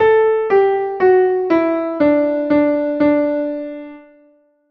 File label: viola example